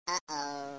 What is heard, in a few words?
effect lose; Helloween